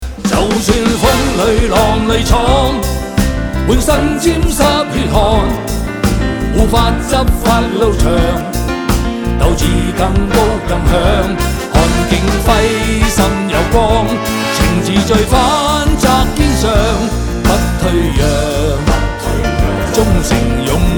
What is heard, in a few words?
short version singer